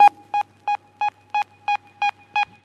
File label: looped, Zaps and Beeps